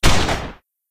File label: Damage